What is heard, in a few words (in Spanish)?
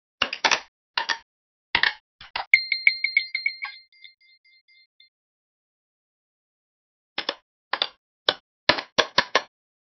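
niños en un cuarto oscuro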